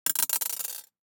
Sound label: DICE on Glass, Throw and Roll, Mini, 3 Three Dice; Samples; ArticulatedSounds; DICE Sfx Party (Extended)